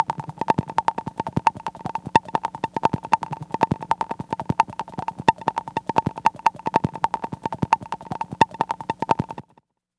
click beat; Polyend

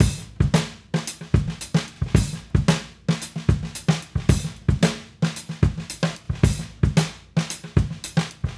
Raw Funk Drums, 70s Analog Funk; Sonic Foundry (Sony Creative Software); What it Is! 70s Analog Funk

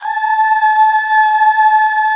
UUHH A5; Best Service Gigapack 1

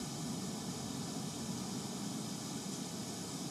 Ambience Loop, outdoor

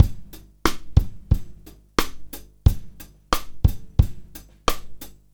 Live Beats, R&B Drums

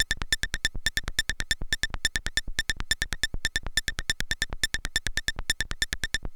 SYNTH PERC; SYNTH SEQ; AMG; Global Trance Mission VOL-2

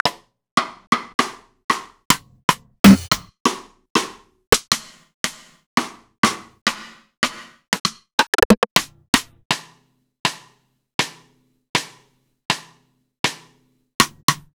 Roland BK-5 Rimshots

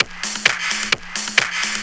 drum 130, techno trance drumloops

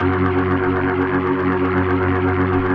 B3, First Call Vintage Keyboards

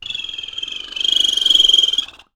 bird calls, percussion; Voice Crystal - Steve Reid Definitive Percussion

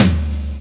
tom, Roland; Club-50 - Foundations Roland; Ext.Snare 3

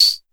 Cr-78 Tambourine, Dance

